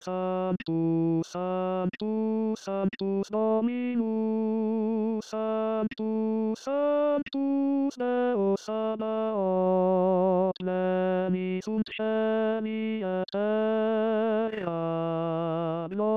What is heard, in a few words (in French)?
tenor; missa pro europa